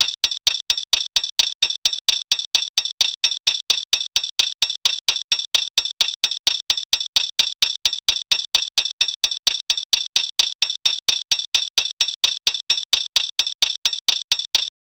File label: broken time machine; chaotic